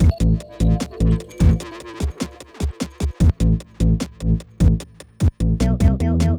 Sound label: Lofi Drums; BB 2HTC Samples Vol 4